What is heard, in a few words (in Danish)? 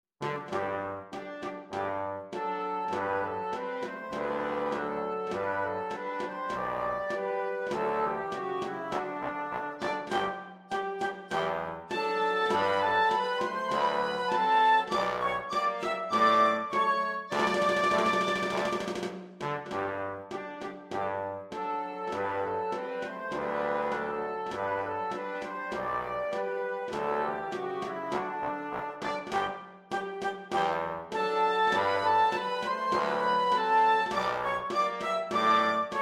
Dansk Musketér Marsch, Hoboistkorps